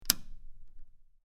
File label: Rotating Switch Clic